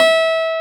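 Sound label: FM RHODES; FUNK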